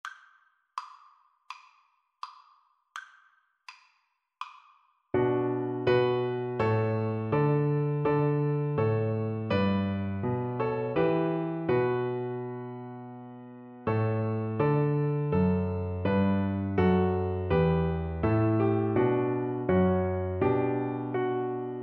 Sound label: emmanuel, Double Bass